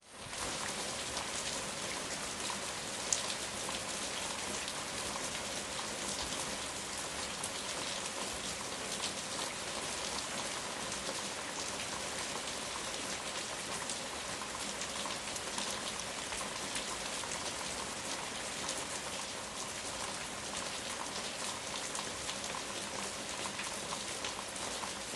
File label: rain heavy; sonniss gdc